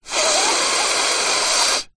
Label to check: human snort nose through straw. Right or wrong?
Right